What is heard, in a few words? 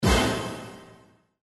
Scatter Symbol Land; slots; Halloween Blast